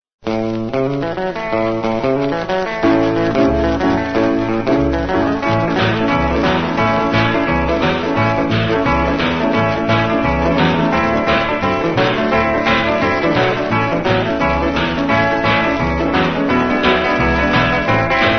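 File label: rock and roll guitar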